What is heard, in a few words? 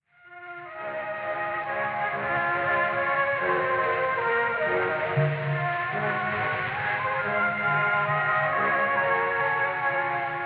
Radio Song; door to door